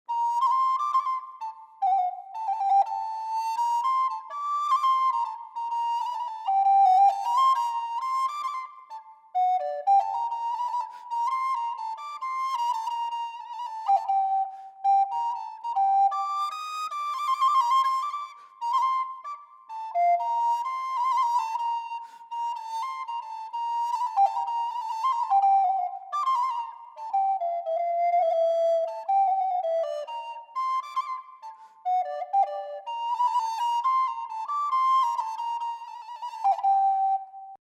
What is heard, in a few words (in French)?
flute bec ornements; baroque; airs sérieux et à boire